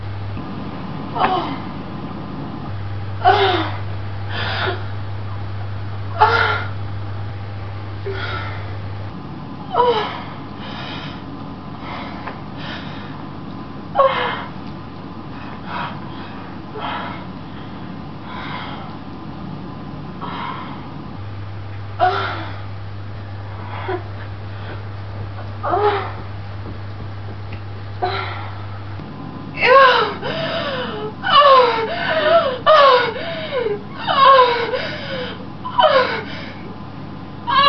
less grainy orgasm

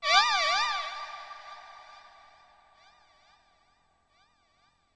alpha fx shift, Electro FX; Zico Alpha Electro FX

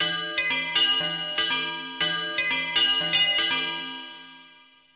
Bells

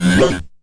resonant whupup; Weird and Interesting Hits; single hits

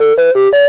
speak and spell startup melody

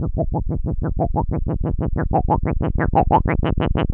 122 wibble wobble, bass loops

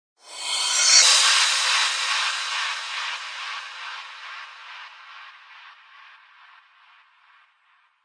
fx two way crash, drums single hits

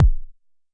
Jomox MBase, drum machine; Jomox MBase 01

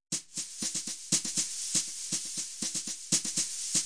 reverse brush hats, 120 bpm

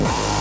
obnoxious kick

